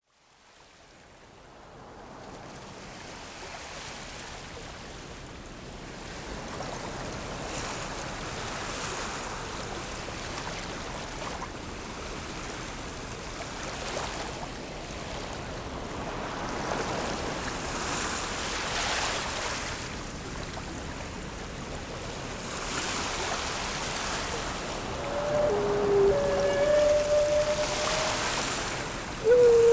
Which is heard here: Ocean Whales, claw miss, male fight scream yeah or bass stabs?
Ocean Whales